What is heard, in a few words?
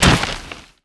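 destroy dirt debris, explosions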